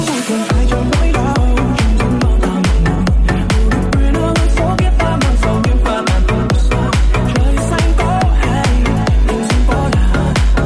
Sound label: remix